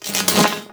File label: Projectile Whizz By; Enemies; Abilities; Warframe; Sentient; Brachiolyst